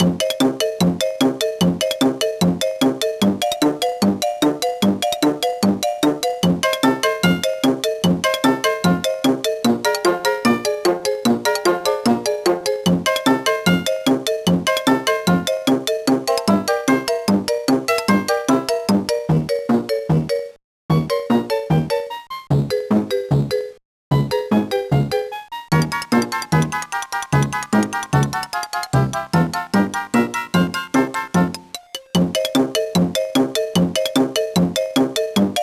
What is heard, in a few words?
TV Cartoon; The Sims 2 Pets